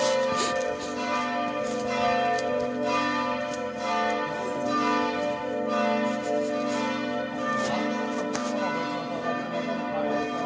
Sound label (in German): Glocken; Pauluskirche; St.Augustin-Ort